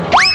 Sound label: cartoon slip sound effect